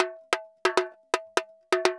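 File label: TAMBORIN, salsa drums and percussion; Best Service ProSamples vol.14; AKAI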